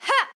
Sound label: Swipe Normal; Jackie; Going Under